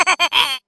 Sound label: goblin laugh; Creatures